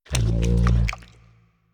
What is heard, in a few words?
eating; exam night 5